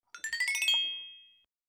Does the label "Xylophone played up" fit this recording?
Yes